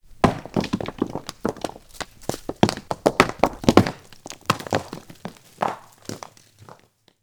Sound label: rocks fall